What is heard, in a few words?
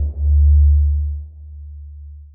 Bass, One Shots; Touch Loops; BlissedOutBeats